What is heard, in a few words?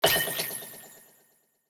Collect; SNKRX